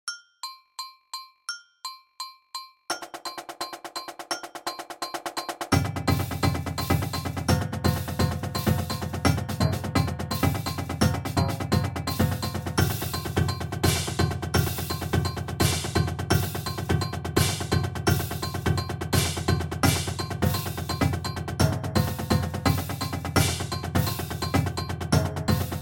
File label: 170bpm, drumline